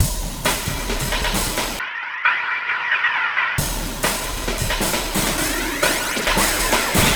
Groovy Drumz 134

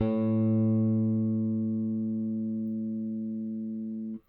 RickThumb-B, RickenbackerBass; Airwindows

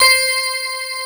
NU-BELL C4; East West - Global Sounds

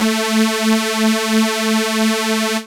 saw lead synth

